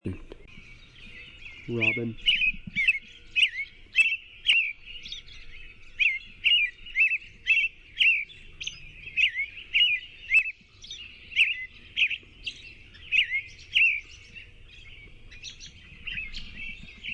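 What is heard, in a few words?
American Robin song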